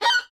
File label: Violin Screech Noise Short; soundscrate